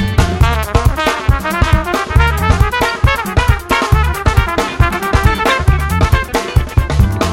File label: Solo